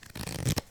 card Fan, Casino; Kenney's Sound Pack

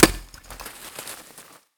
hit, Processed Foley; 99Sounds; Sound Design Tools

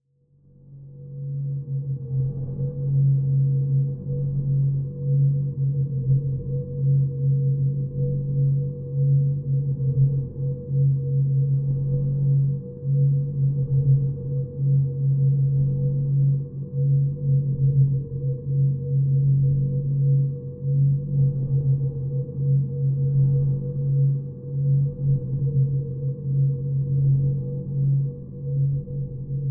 Magnetic Field; 99Sounds; Magnetic Fields

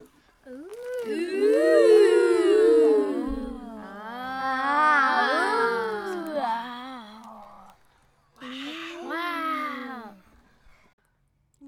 Group kids uhh ahh

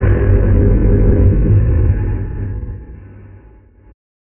Boom Hit; Matias Vidal Saavedra; Filmmaker Ultimate Sounds

